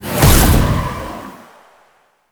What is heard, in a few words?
Final Chapter Impact; Warframe; Dante